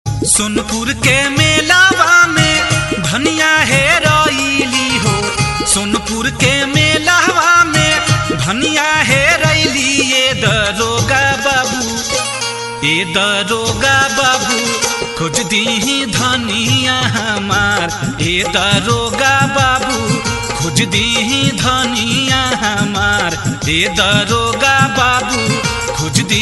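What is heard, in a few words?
Bhojpuri; Sonpur K Mela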